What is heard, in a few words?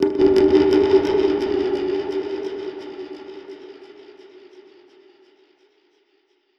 Perc Hit, dub percussion; musicradar